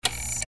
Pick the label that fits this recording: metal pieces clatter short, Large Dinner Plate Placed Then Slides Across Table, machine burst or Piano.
machine burst